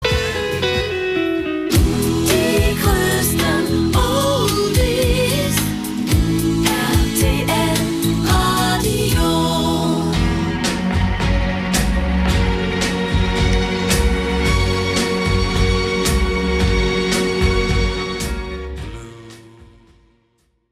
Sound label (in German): oldie jingle; rtl; rtlradio chronik; 1995